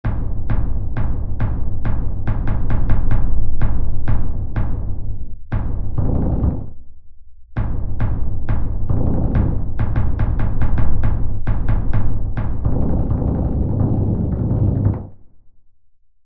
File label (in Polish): Wielki beben